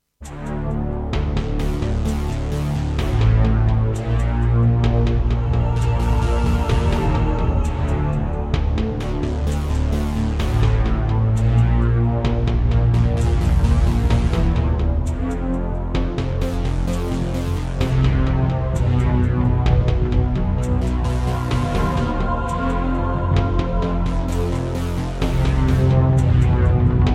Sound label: Roland SD-50 GM2MODE; Descent II